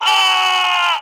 8bit Scream

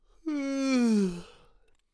Yawning

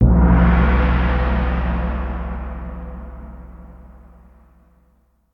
Gong

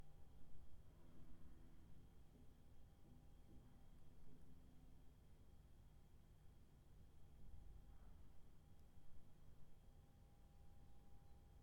Roomtone Empty classroom Long

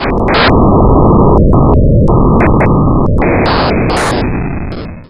Broken Radio Signal

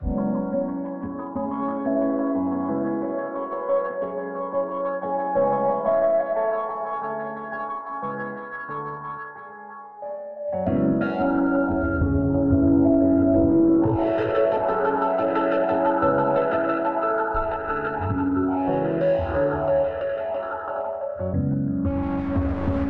Piano Ambiance Distortion Echo

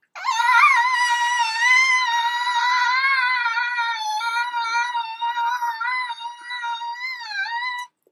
Child Scream

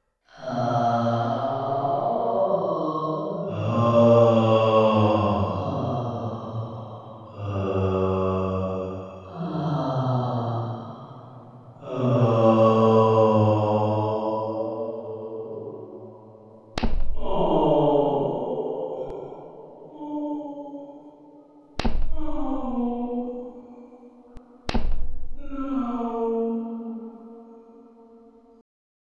Groans

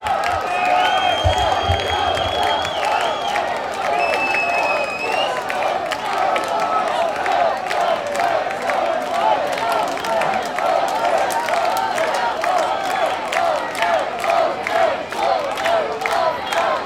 Applause Rioting

